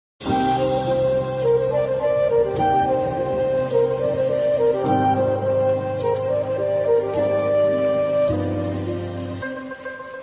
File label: rain theme ringtone bgm